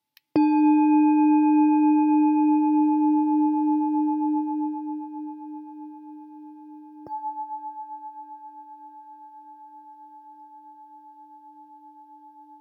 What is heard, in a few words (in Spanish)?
jambati 16cm re, cuencos tibetanos